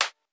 Korg snare

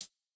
Jazz hop hihat

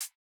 Modern hihat, Electro

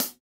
hihat, house

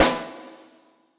hall resonance snare

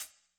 hihat; pasific; Pop

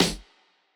snare, lofi hiphop; Legendary lofi hiphop

